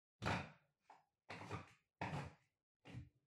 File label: Washer Front Load Far Filter Lower Insert